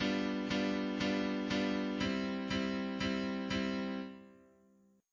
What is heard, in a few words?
chord only